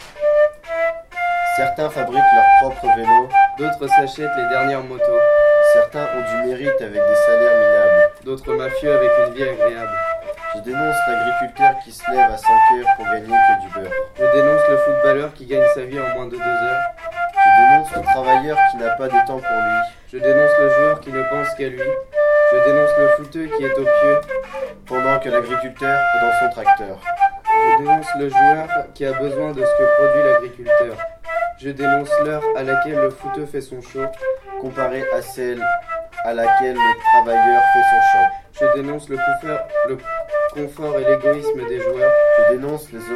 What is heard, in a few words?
flute